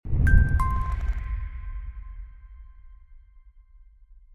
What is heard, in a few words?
start quest